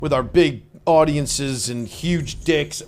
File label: audience